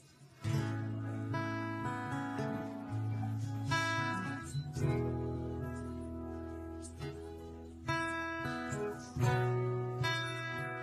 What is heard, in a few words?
melody big shield; Minecraft; Votive Content